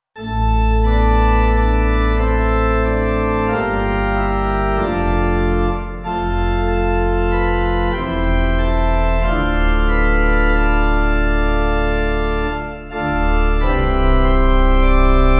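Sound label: Pipe organ, church music backing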